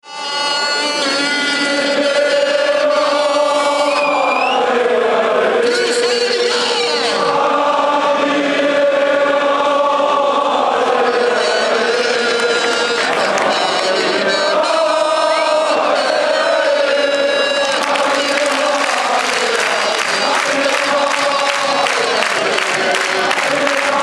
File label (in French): Marines et Blanc allez allez